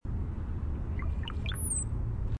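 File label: Brown-headed Cowbird song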